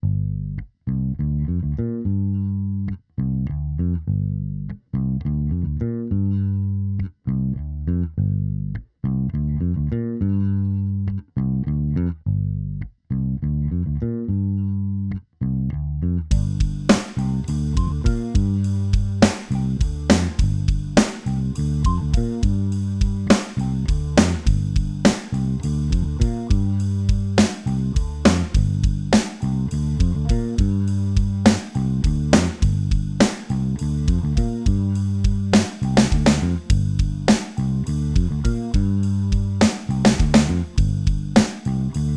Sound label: drums